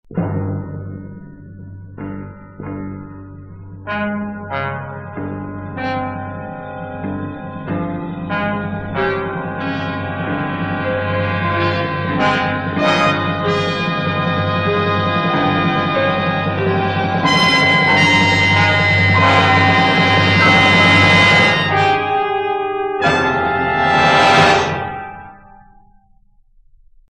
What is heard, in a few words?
wave music